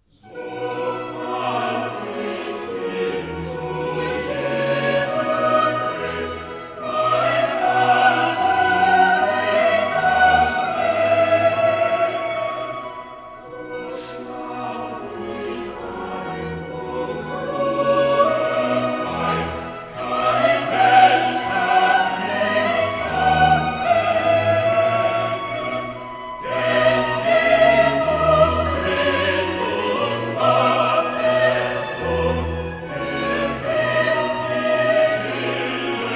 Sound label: Choral; Cantata, BWV 31 'Der Himmel lacht! Die Erde jubilieret'; Bach; Eisenach; Easter Sun & Mon